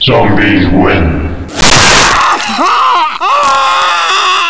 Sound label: win zombie